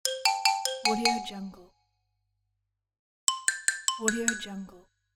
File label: cartoon musical xylophone hesitancy